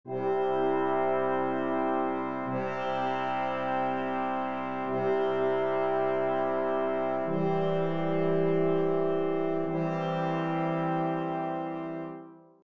brass

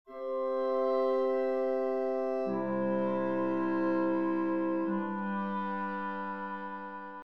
chords

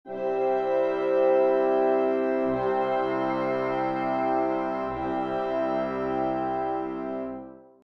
trump chord

